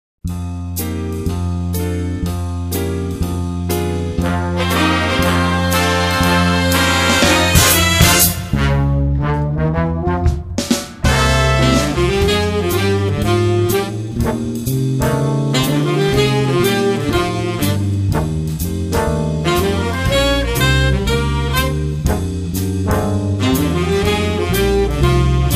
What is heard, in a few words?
jazz